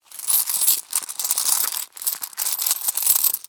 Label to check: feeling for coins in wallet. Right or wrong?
Right